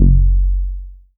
Rubber Bass; Best Service Gigapack 2

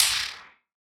Wider Clap

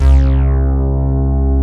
micro bass, rezo bass; Bass Analog & Synth; C2; USB Soundscan vol.12; AKAI

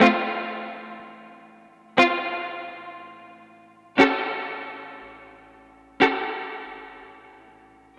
Guitar, 70s Analog Funk; Sonic Foundry (Sony Creative Software); What it Is!

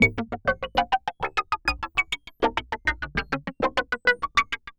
perc trundle, Percussive Loops, 100 bpm; Sample Magic - Transmission-X

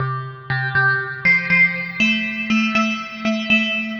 Synth Textures

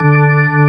Org2 D4; Best Service Gigapack 2

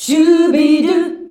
"SCHUBIDU" F, FEMALES

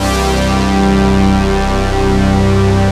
pads G; Zero-G; Total Drum Bass

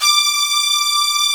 D 4-VI S.H, VIOLA SECT; AKAI S6000 CD-ROM - Volume 1; Strings

